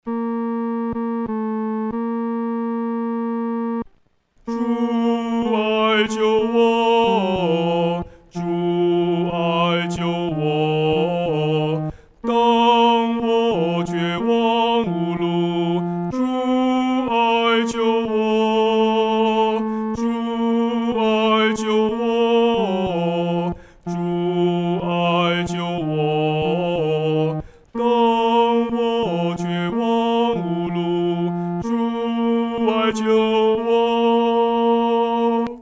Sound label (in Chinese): 独唱 第三声